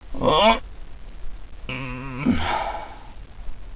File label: groan grunt